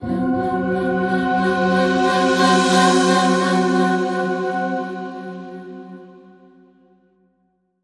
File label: Vocals Magic Pulse Swell